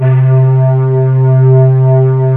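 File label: JV square lead, JV-1080; Syntec; Wall of Sounds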